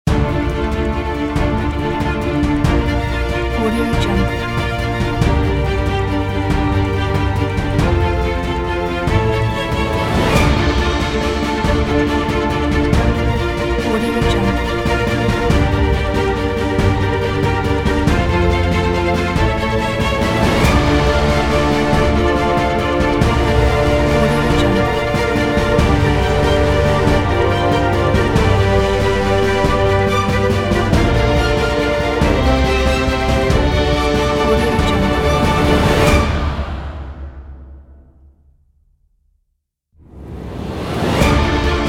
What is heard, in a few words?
loopable epic action ident